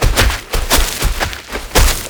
Wolf Run Loop; Animals; Duviri

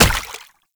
Proto Infested Step Water; Enemies; Steps; Warframe; Brachiolyst

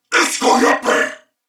Surprised Corpus Venus Male, en; Dialog; Barks; Battle; Warframe; CorpusVenusMaleB

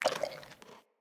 Camel Idle Chew; Khaban Camel Vendor; Vocalizations

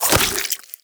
Drop Impact, Hunting Critters; Nexifera

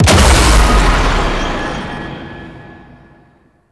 Ally Death Start; Warframe; Tenno

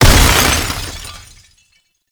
Vial Hazard Explosion No Liquid; Gameplay; Hazards; Warframe; Entrati

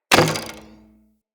Bow Shot Twang; Weapons; Player; DendritBow

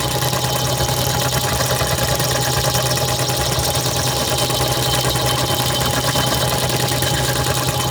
Sea Lab Small Fan Loop; Warframe; The New War

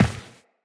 Step Default, Footsteps Walk; Characters; Tenno

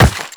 Run Rock, Footsteps; Warframe; The New War; Characters; Kahl

